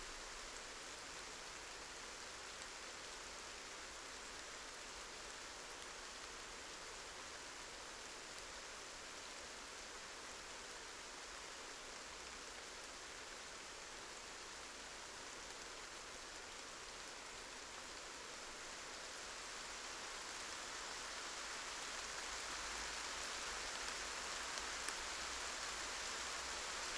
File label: Rain shower onset; boom library; Thunder And Rain